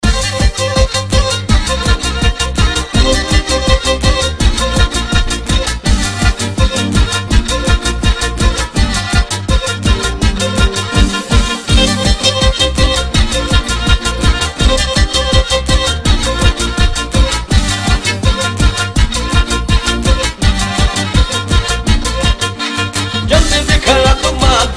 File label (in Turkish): oy kemence; oynak zil sesleri